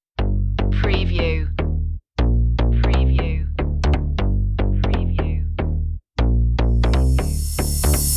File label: latin groove loop intro; media music now